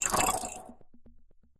editor paint collapse; Source Engine; Portal 2

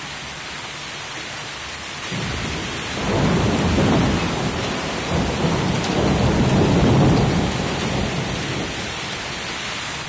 thunder rain; ambience; Source Engine; dod

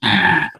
ballbot confusion

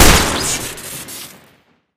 pistol shoot crit; tf

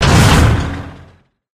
tank drop; video games; player; taunt; source engine; tf

